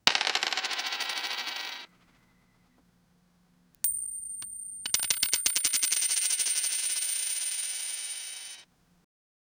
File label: multiple coins falling